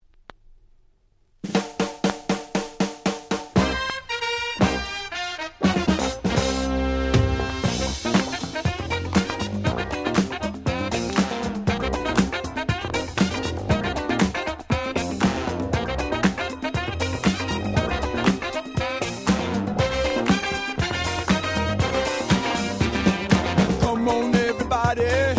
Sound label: funky clap